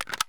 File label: saiga magin plastic; Escape from Tarkov